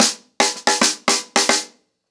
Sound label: Snare 110BPM, ACOUSTIC DRUMS; Sonokinetic - The Roots Drumloops